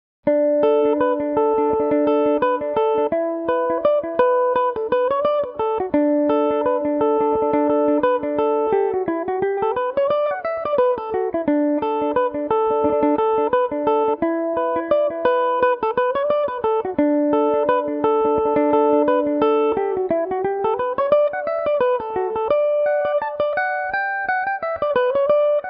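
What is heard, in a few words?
reel D major, Irish mandolin; killavil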